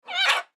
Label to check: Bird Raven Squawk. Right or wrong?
Right